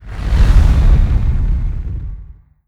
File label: nemesis colony ship moving out; Stellaris